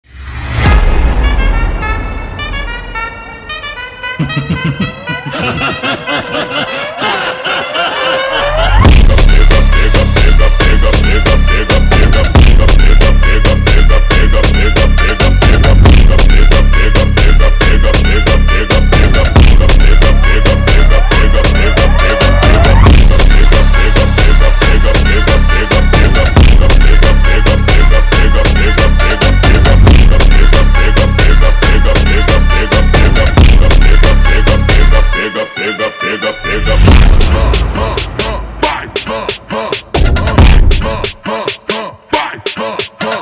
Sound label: Phonk